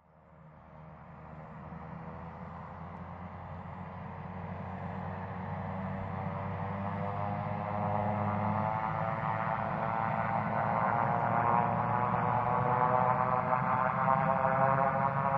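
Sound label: Prop Airplane Take Off, c 130 hercules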